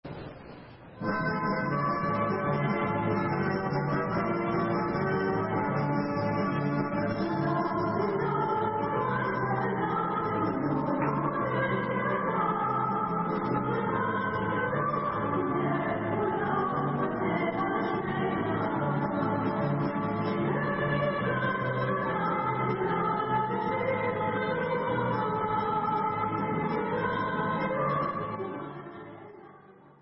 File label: Instrumental